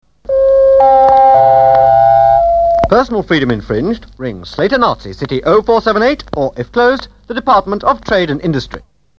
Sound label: Jingles